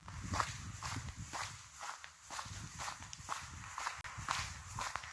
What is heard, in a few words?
footsteps